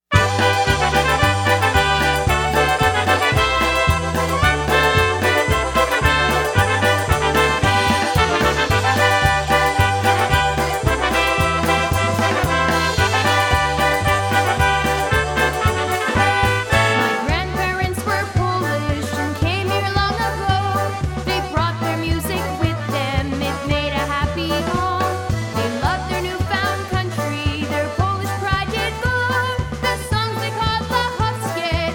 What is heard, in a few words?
Polka